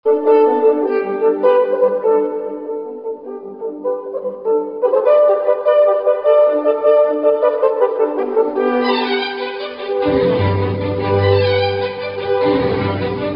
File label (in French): cor orchestre; Le cor que l'on voit de nos jours est dérivé de l'ancien cor de chasse; Final de la Sinfonia da caccia pour 4 cors d'harmonie et cordes de Léopold MOZART